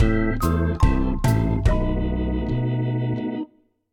Lose; Ralph

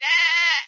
Lamb Boy Scream

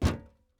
metal land

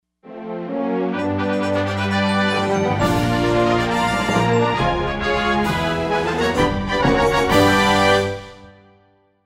Win; fendin' DePenguin (Wii) (gamerip) (2008)

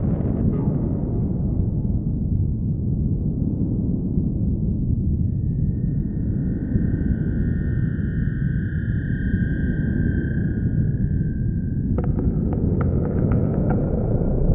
Ambience; The Fall of the Dungeon Guardians

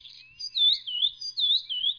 Flycatcher

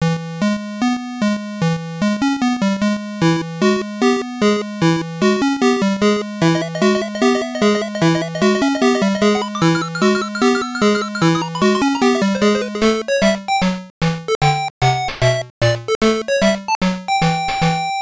Title, Level Clear, Credits; Taz in Escape from Mars; Game Gear

